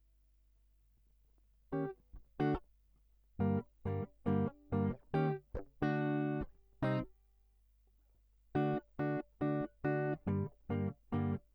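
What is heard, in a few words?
Guitar Line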